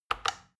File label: plastic button tiny click regular fast